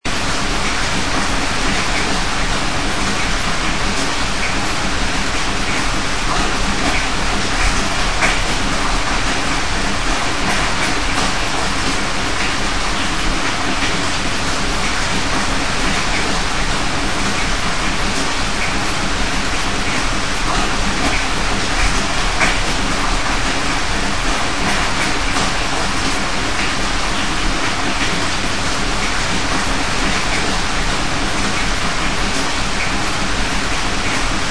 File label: rain indoor